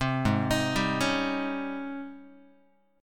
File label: G sharp Major 11th, Guitar; 3,4,6,5,5,x; JGuitar